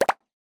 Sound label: peta